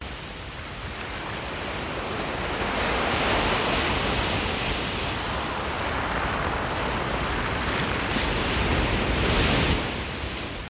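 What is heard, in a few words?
beach sound effect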